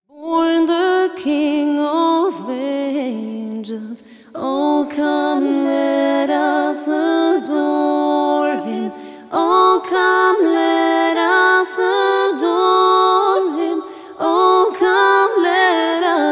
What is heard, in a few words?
O Come Holy Faithful Accapella